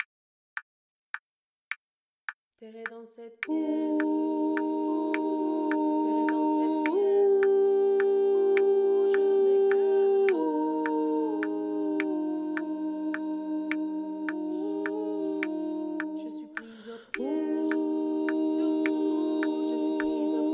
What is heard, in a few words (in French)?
LE LOUP VOIX